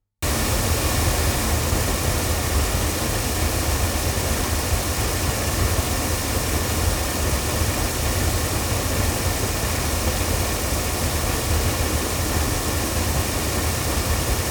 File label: Neumann TLM170 cardioid loudspeakers